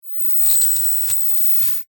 Electric Discharge, Magnetic, Science Fiction; Sci Fi Sound Effects; Blast Wave

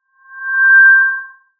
Sci Fi Scanner Beam Short; Tech; Blastwave

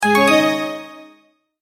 multimedia tone advance

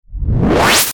Ascend Deep Distortion; Blastwave

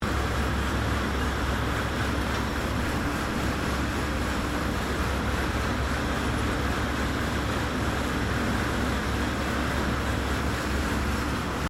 FACTORY BOTTLE MAKING AMBIENCE; General factory Ambience; Machinery; Machines

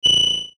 BEEP DIGITAL, Beep Digital Flutter Vibrato; Production Element Imaging Element Accent Transition; Multimedia; Beeps

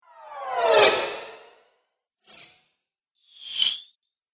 Quick Spells, Magic Sound Effects; 3 Quick Spells - 01; Role Playing Game

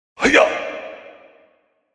Martial Arts Yell "Hiyaaa"; Combat Voice Sound Effects

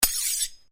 Blade Swish Low, Sword

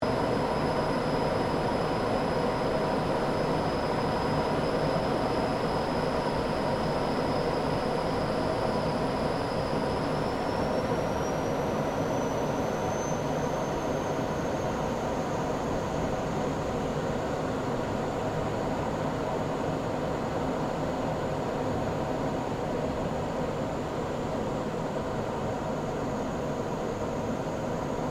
AIRCRAFT GLOSTER METEOR F MK8 FLYING INTERIOR; Gloster Meteor figter jet; Airplanes; Vehicles; Aircraft Collection; Twistedtracks

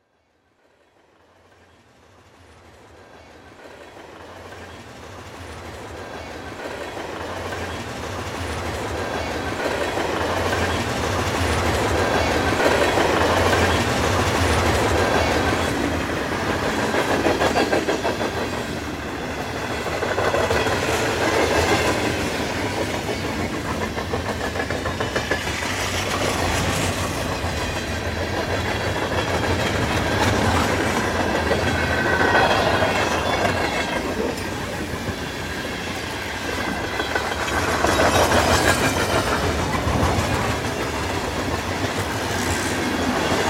Train Drive By Short; Transportation; People Sound Effects; Blastwave

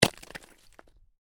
Ice: medium chunk of ice lands on hard surface; Snow; Stuart Duffield

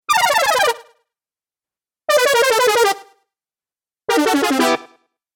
Videgame Failure Fx Collection; Video Game Sound Effects

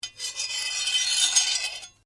Blade Scrape Medium, Sword

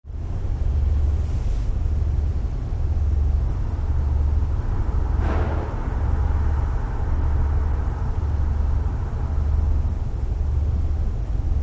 Ship Large Ferry Car Deck with Vibrations; Tom Hutchings

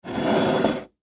Ash Tray Slide On Table Glass; Slides (Sound Effects:Objects); Foley; Blastwave

